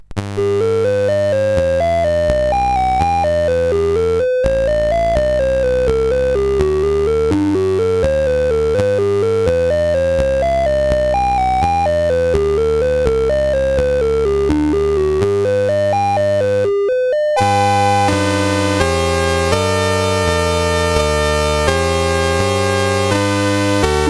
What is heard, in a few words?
Demo Music, CSG8580R5